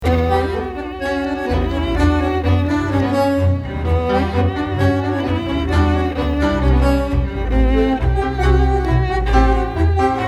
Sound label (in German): klassisch